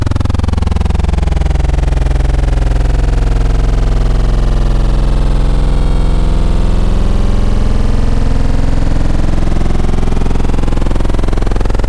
Digital Folded Saws, Wavetables; Synthetic's Serum Collection; ESW Core Wavetables; Echo Sound Works Core Tables

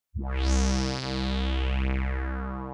fat res synth in C; 'Effection' samples; lolicore

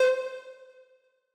SYNTH Golden; Synthetic's Serum Collection

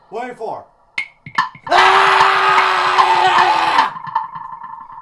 Click track grindcore; lolicore

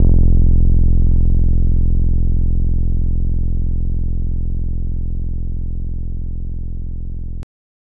Acid Square C1; Synthetic's Serum Collection; Evolution Of Sound - Deceiver Vol 2

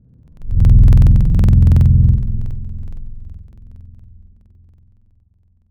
Reggae Trump STCT, Wavetables; Synthetic's Serum Collection; Standalone-Music SYMPHONY Wavetables

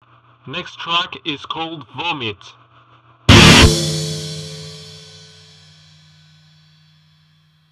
VOMIT; lolicore; HM Records

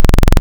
Saw Thin; Wavetables; Synthetic's Serum Collection; ESW Core Wavetables; Echo Sound Works Core Tables

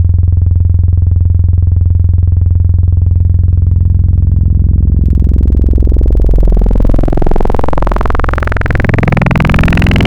4th Formans Sin Mod, Serum Wavetables; Synthetic's Serum Collection; Cymatics - Nuclear for Xfer Serum Wavetables

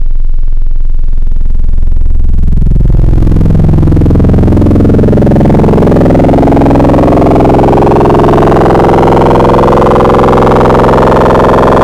Basic Mini Sin Mod, Serum Wavetables; Synthetic's Serum Collection; Cymatics - Nuclear for Xfer Serum Wavetables

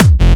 free kickbass, dockick; Looneytunes; lolicore

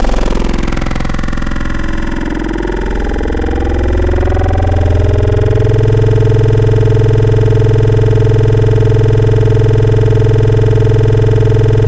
Vocal Animal Ahh; Wavetables; Synthetic's Serum Collection; ESW Core Wavetables; Echo Sound Works Core Tables

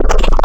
Growl; Synthetic's Serum Collection; Growl Starting Points

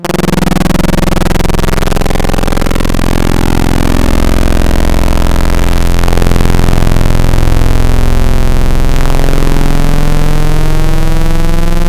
Sawtoothed Mess, Serum Wavetables; Synthetic's Serum Collection; Cymatics - Nuclear for Xfer Serum Wavetables

